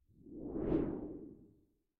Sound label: Woosh Sound Low Frequency